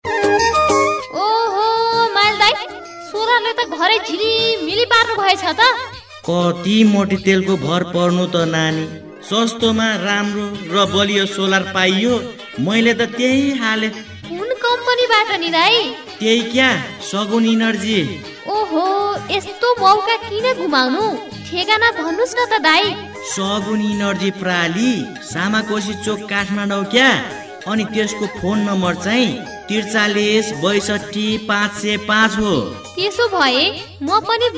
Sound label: energy jingle